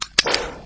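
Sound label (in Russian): необычный звук переключения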